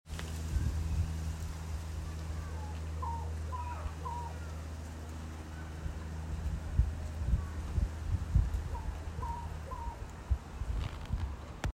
Mystery bird

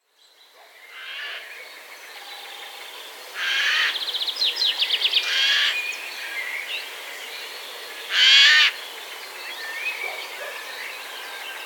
Eurasian Jay Garrulus glandarius

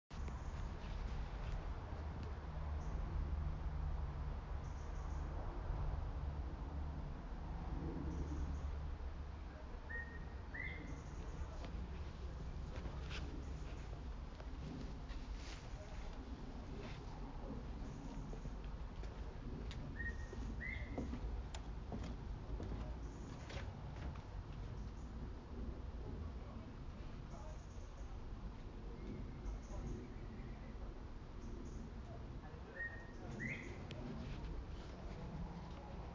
Unknown Bird

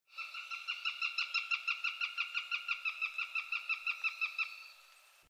Northern Goshawk Accipiter gentilis

